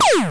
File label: shoot